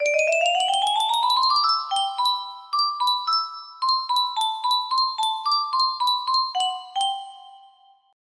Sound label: music box power out; five night at superskelleton